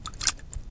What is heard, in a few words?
water